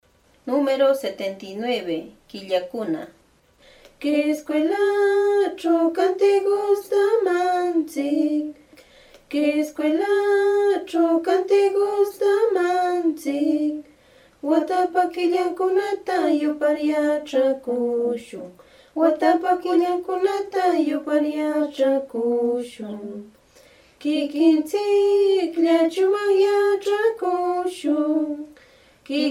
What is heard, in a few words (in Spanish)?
cancion